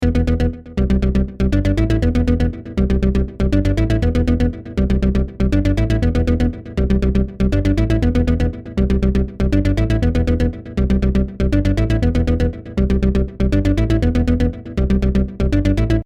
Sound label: synth b unprocessed